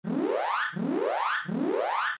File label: Alert